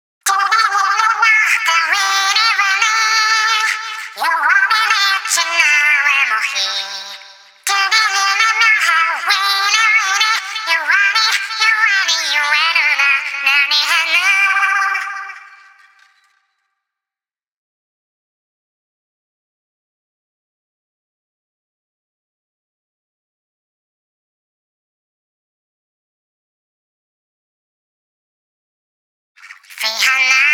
News Festival Vocal

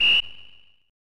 Whistle

